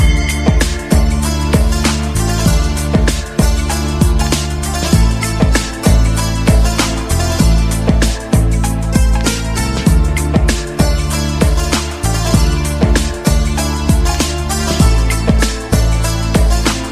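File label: beautiful Indian melody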